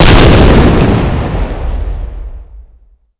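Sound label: explosion metal barrel distant; Explosions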